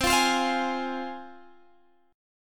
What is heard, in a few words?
C Suspended 4th Sharp 5th, C, down, Guitar, Standard; Csus4#5; strummed; capo=2